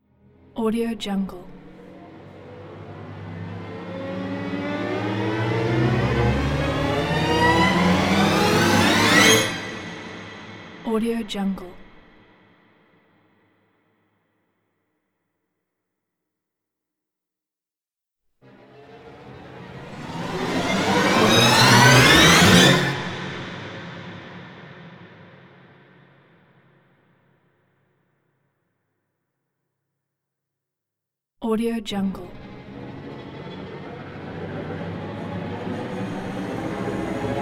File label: organic string risers